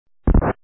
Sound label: Burst